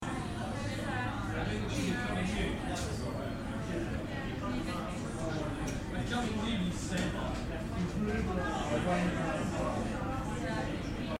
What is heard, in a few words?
early evening pub chatter, Edinburgh; at doctors